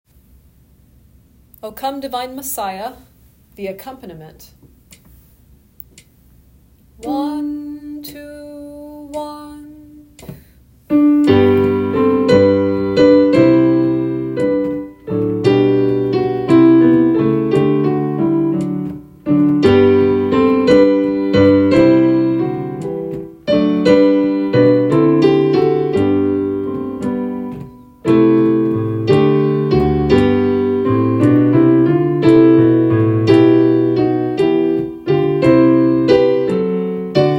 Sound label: accompaniment; O come divine messiah